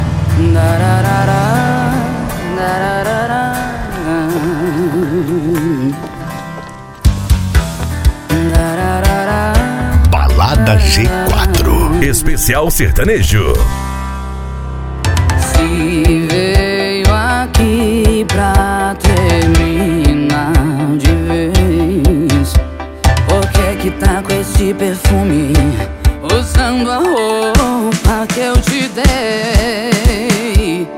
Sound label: sertanejo; balada g4